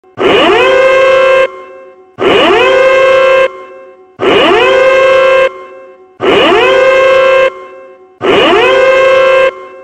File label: quarantine alarm